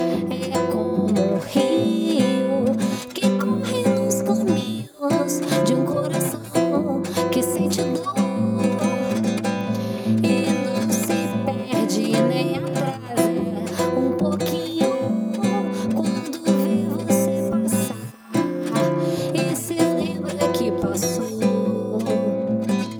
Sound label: guitar; SiSEC11